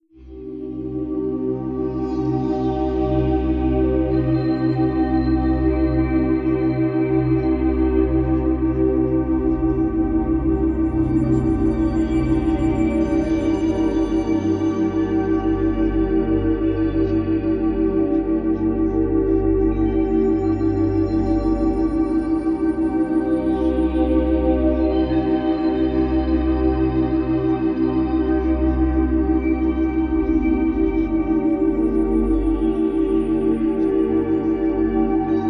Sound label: binaural beats; Weight-Loss